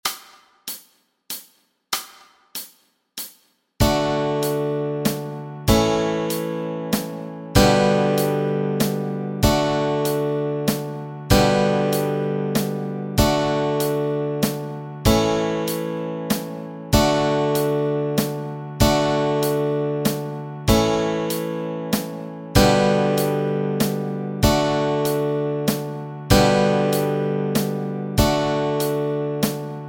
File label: chords, Easy Guitar and Ukulele